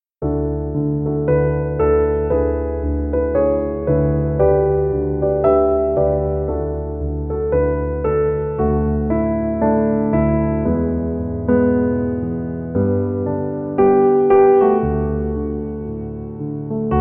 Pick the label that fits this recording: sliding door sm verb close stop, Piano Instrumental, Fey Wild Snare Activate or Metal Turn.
Piano Instrumental